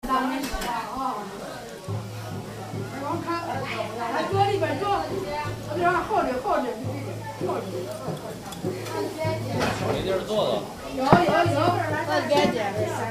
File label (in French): resto xian